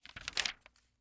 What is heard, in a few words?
Household Magazine Page Turn